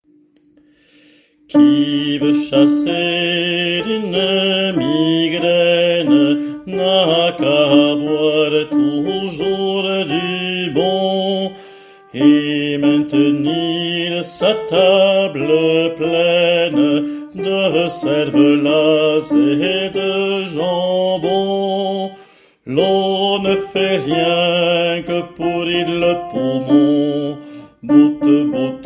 chanson a boire alti; qui veut chasser une migraine

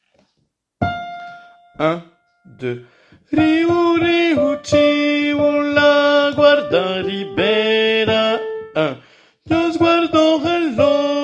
refrain tenor

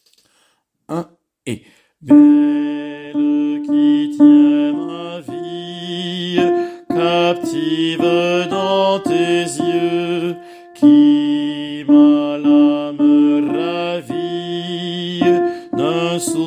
alti, chorale; belle qui tient ma vie

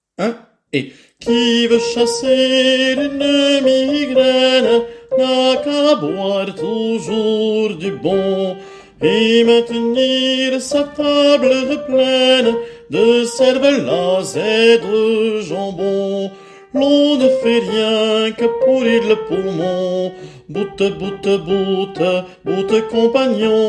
chanson a boire soprani; qui veut chasser une migraine

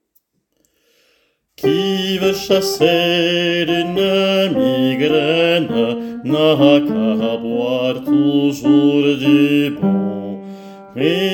chanson a boire couplet bassi, chorale; qui veut chasser une migraine

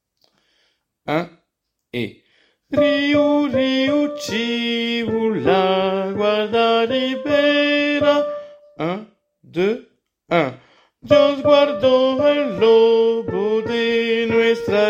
refrain soprani, chorale

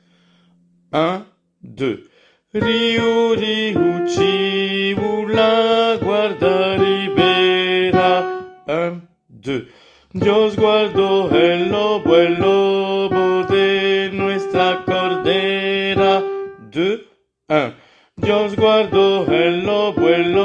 refrain alti, chorale

